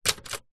office typewriter key press typebar front close to key vintage 1960; lemair helvetia